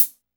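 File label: closed hi hat, jungle kit; Drums In Your FACE!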